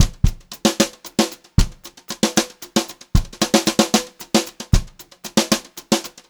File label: Brushed Drum Loops; USB Soundscan vol.39; AKAI; 90 s Sample CDs; 76 HOTROD